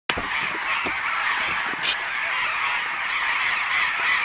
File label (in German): papageien